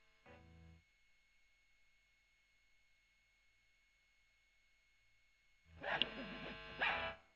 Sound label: E.GTR Gefell OD